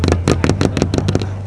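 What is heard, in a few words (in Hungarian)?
ronda hang, Peugeot 207; totalcar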